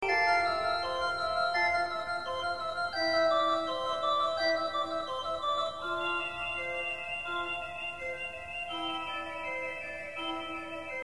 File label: organ